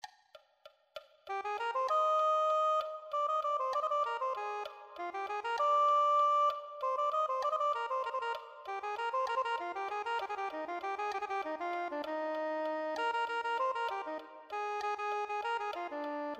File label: soprano saxophone